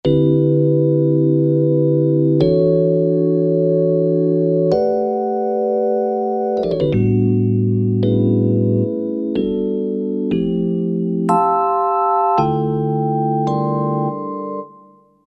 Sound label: EP Chd, Roland SRX-05 Supreme Dance